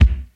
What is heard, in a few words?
round bass drum single shot c sharp key